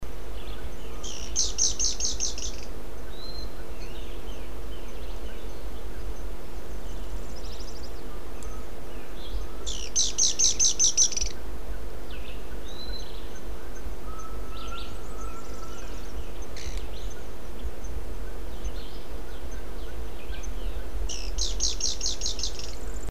Phyllomyias reiseri

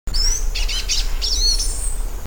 Cissopis leverianus song

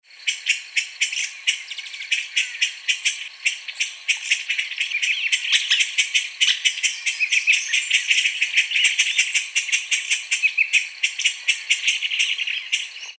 Philydor atricapillum harsh

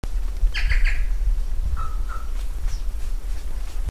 Cyanocorax chrysops chok

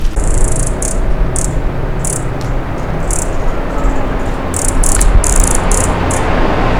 Hylocharis chrysura antagonistic

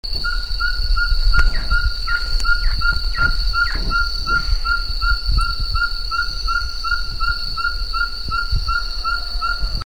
Glaucidium brasilianum

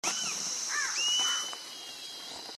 Myiozetetes cayanensis

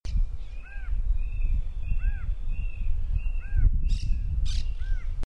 Nothoprocta cinerascens

